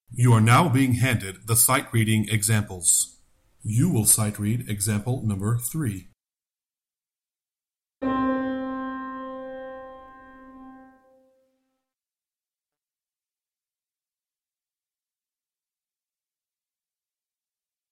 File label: Sightreading Example Tenor